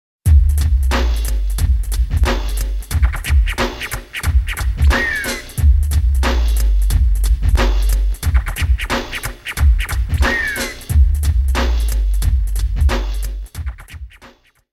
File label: loading